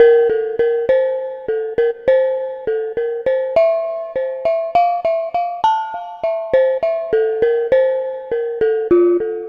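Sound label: Pelog Bonang Melody; Melodic; Ambient-Melodics-II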